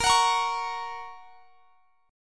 Bb Minor Sharp 5th; Bbm#5 strummed

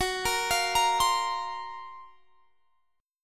F sharp Major Sharp 11th F sharp; F#M#11 Chord; arpeggiated; x,21,20,22,23,20

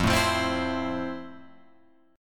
F Major 7th, Guitar, Open F; strummed; JGuitar

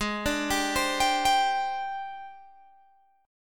G sharp Major 7th Flat 5th, Guitar, Open G

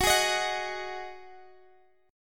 D sharp Minor Double Flat 5th F sharp

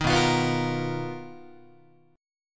B Major 7th Flat 5th B down Guitar; BM7b5; strummed; JGuitar

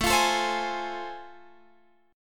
A Minor Major 7th Sharp 5th, Guitar Open F; AmM7#5; strummed

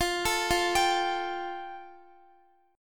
F Major Add 9th, Guitar; arpeggiated

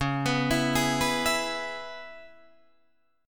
C sharp Minor 7th, Guitar Open D

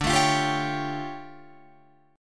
D-Minor Major 11th, D, Guitar, Drop D

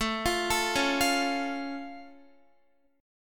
A Augmented A down Guitar; A+ arpeggiated

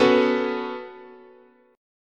B 7th Suspended 2nd, chord, guitar

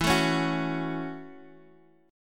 F sharp Minor E, Chord; strummed; JGuitar

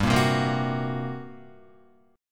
G Minor Major 7th Double Flat 5th, G, Guitar; strummed; JGuitar

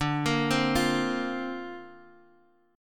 D-Minor 7th Sharp 5th, D; x,x,0,3,1,1; Dm7#5 arpeggiated

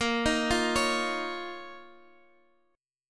Eb 7th Suspended 2nd Bb

